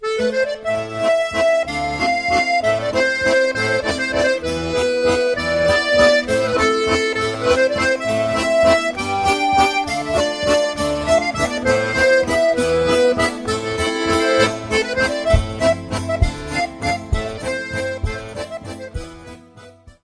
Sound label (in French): Valzer - Italie - Sicile; Valzer di Mezzanotte